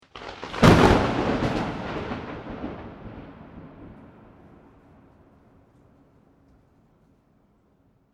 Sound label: thunder; nature